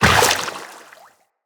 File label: Step Water, Footsteps, Walk; Tenno